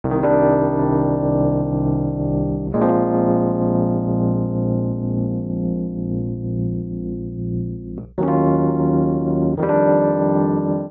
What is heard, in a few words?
Progression Chill i - vii - iii - iv - b5 - vii C Minor 88 bpm, Electric Keyboards; Ableton; Factory Packs